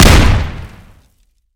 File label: hit; hellblazer